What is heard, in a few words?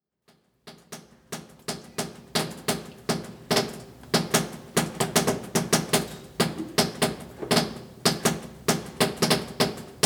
awful indoors leaking after a massive storm in a west african island